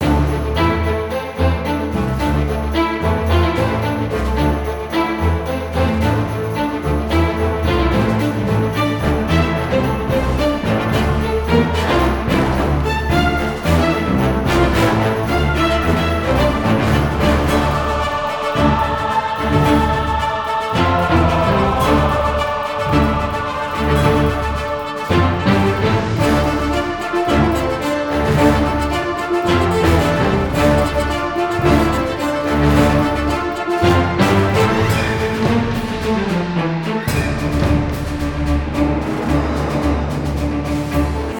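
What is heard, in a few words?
background music; Pirates of the Caribbean Dead Man's Chest; PlayStation Portable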